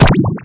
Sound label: mushroom snail